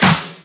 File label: Shifted Clap; FLPRO